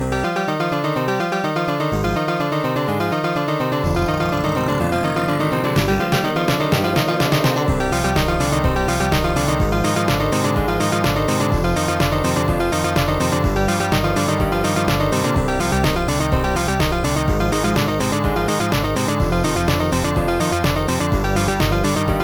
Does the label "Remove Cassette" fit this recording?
No